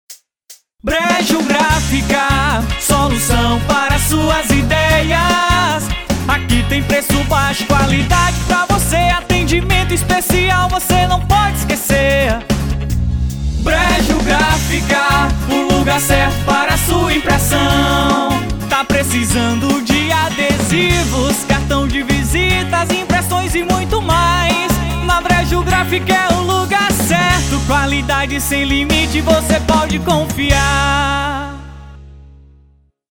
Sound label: jingle